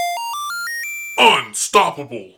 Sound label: jingle unstoppable